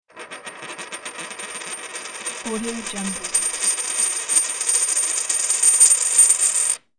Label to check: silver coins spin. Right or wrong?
Right